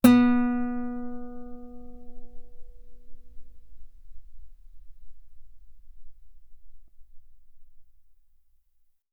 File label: Guitar ff sul B B3